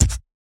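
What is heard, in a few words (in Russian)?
битбокс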